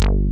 SYN BASS; Pro-Rec - Synthsonic; BASSES

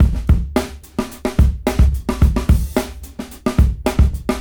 Brown 105 BPM; AMG - Keith LeBlanc Phat Butt Jams

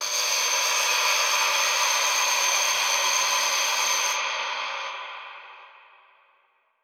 Echo Proc B, alarm siren samples, 120bpm; musicradar

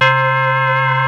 SPLIT; Rubber Chicken Software Sample Bank

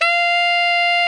TENOR, Saxophones; Greytsounds - Philip Wolfe David Crozier's Saxophones; TENOR SWITCH

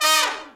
SECT BRAS FALL

